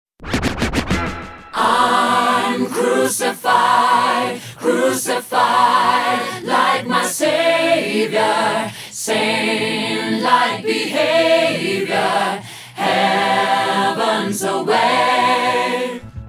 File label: acapella